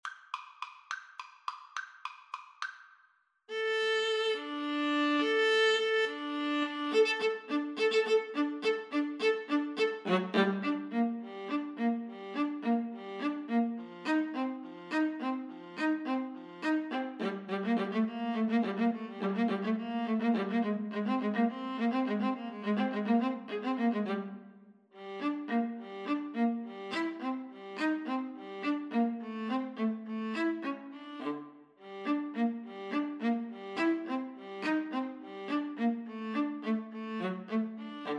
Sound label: Viola Duet